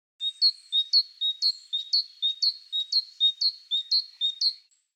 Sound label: parus major